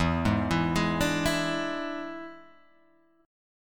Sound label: E 7th E down